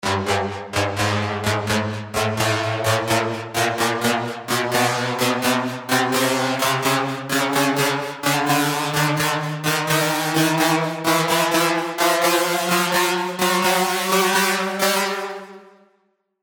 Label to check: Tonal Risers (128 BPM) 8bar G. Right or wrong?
Right